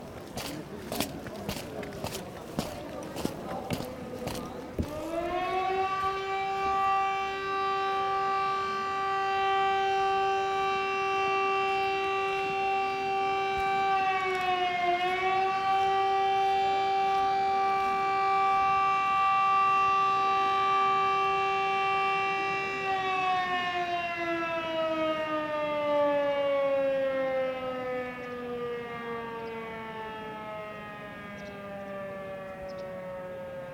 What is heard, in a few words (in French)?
sirene pompei